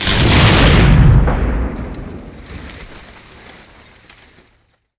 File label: metal Jaw Explode